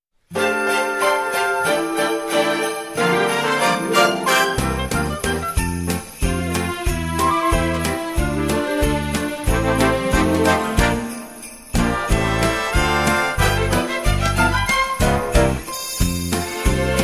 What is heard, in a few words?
jolly old st. nicholas instrumental